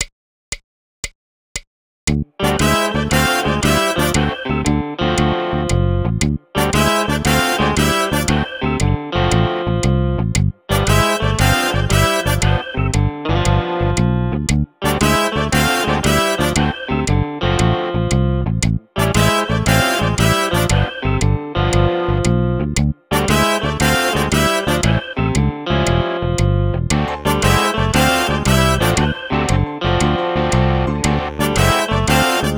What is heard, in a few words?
Blues Shuffle Riffs